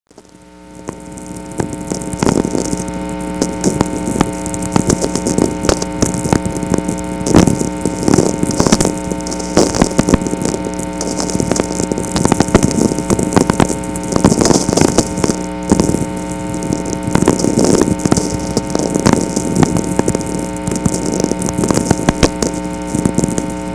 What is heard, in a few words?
sferic; Natural VLF Radio Emissions